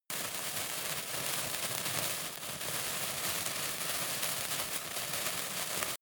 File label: fire object small burn